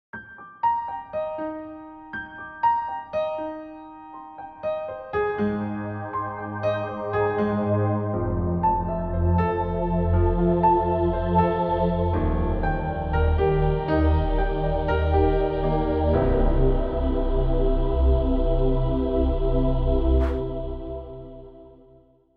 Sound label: grand piano, acoustic instruments; logic pro